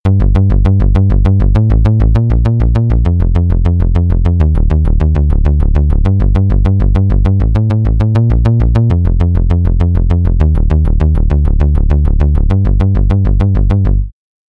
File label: Syn Bass